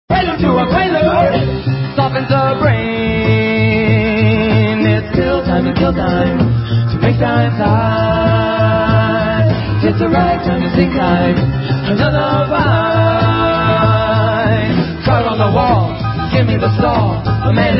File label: lofi prelude; broadway